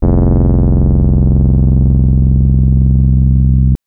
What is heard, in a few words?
Synth FM 2 Op Basic 3 c3